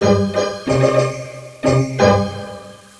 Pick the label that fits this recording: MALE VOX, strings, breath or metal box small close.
strings